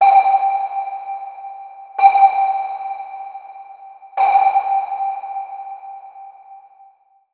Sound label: Sonar